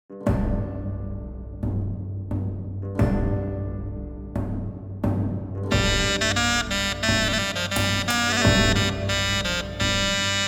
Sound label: Singing Horns; Atlas Fallen